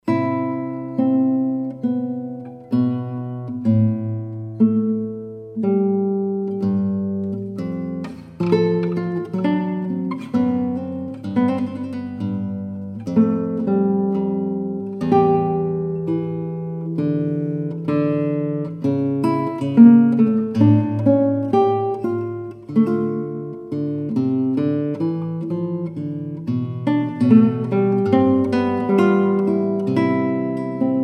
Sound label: air on a g string